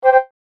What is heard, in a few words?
Multimedia Chime, Technology, Multimedia, Chime